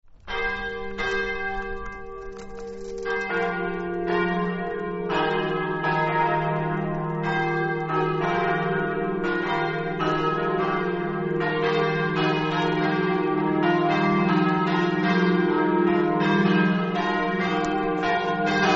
turrida, campane

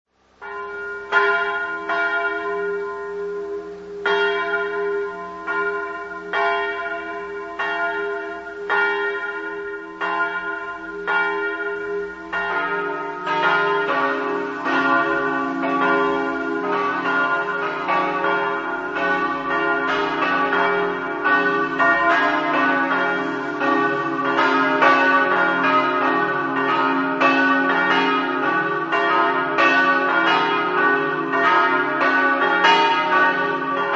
campane, bertiolo

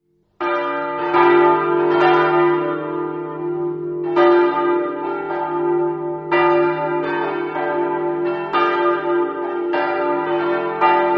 campane, rosazzo

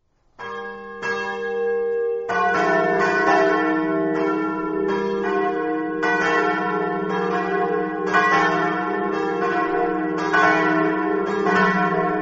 raspano, campane

